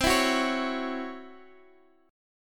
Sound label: Db Minor Major 7th Double Flat 5th C